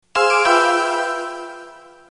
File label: alarm